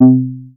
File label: MOOG BASS C4; Best Service Dream Experience